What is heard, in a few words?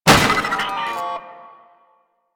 Jammer Destroy; Warframe; Nineteeen Ninety Nine; PvPvE